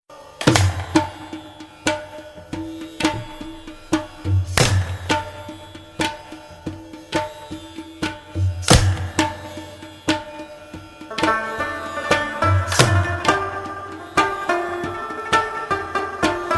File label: Rabab Music